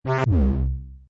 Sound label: Evacuate Dry, Sci-fi deep sweep sound. Plasma, futuristic machinery; Loop for klaxon/alarm.